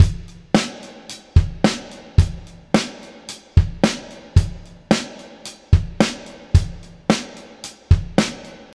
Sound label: 110 bpm drum loop sample f sharp key, kick tuned to the F# note